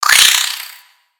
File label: Spider Rage; Lego; creatures mechanical